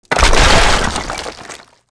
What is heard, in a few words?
fence stone wood; World of Tanks Blitz